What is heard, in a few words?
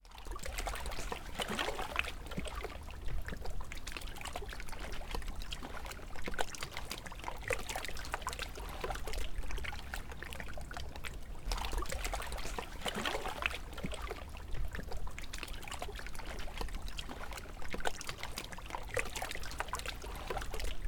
Clapotis Lappings